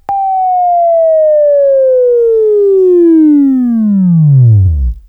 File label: WAV Left, Sweeping; Labview-Program; Sweeping Training program